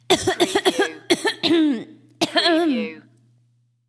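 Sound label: Female Coughing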